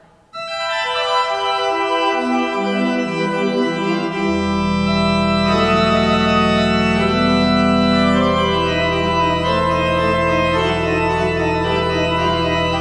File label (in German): Orgel; Paulus-Orgel